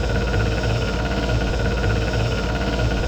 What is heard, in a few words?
conveyor; oklahoma